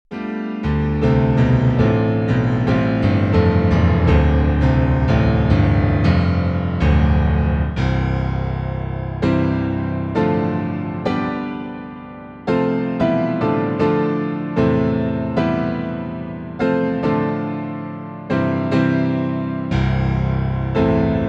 engloutie bass test bluethner softer

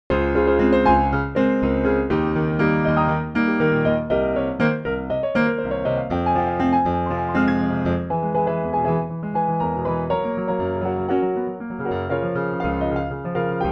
Natural Fast Piano Stuff; L7 Natural

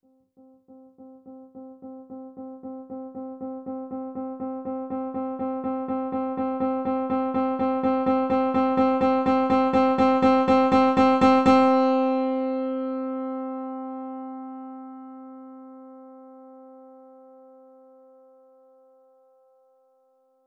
C3 PLAY Casio Headphones

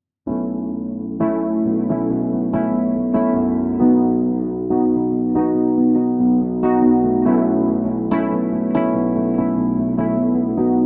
wurly

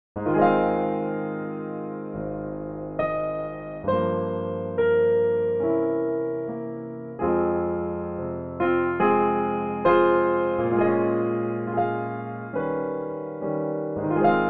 early jazz

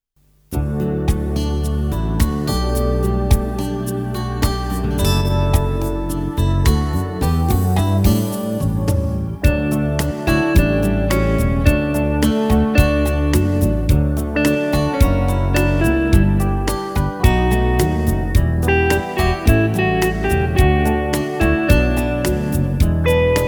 Classical Guitar Electric Jazz

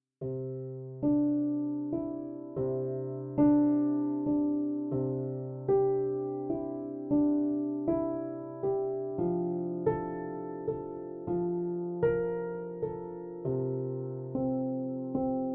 exposed Bechstein

